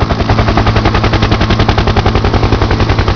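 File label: ka50 rotor, helicopter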